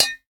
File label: Saucepan Bounce; Boomerang Fu